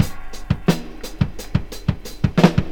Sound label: rock punk, drum loops